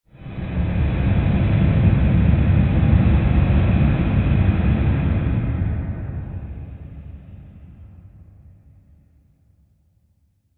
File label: Swell Airy Rush Rumble Long; Sound Design; Big Room Sound